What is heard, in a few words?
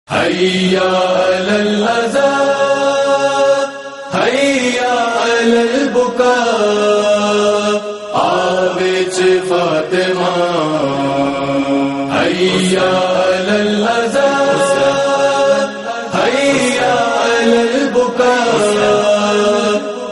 Gujrati Noha